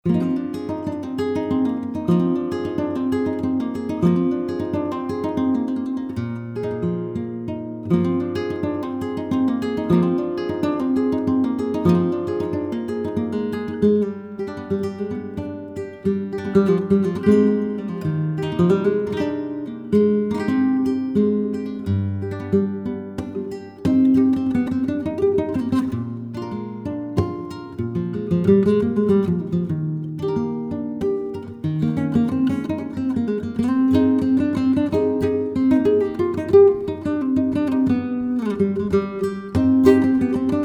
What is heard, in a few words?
Solea, guitar